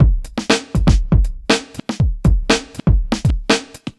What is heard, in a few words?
Bonus Beat, Drums